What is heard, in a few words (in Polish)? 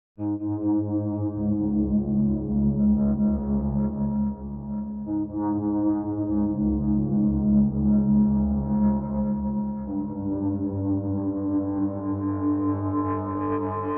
bass on synt, Pady; Muzyka Ilustracyjna